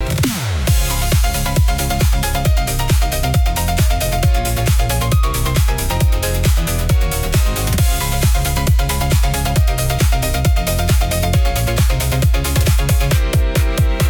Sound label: loop